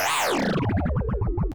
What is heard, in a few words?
Power Down